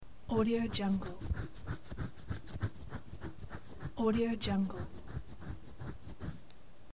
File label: itches scratching hand